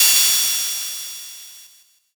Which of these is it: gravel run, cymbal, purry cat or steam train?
cymbal